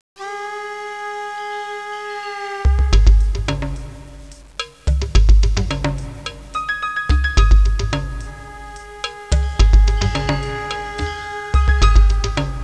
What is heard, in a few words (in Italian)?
suonerie musica hawaiian